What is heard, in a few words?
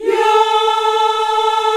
VOX African Yas; Roland LCDP11 Africa VOL-1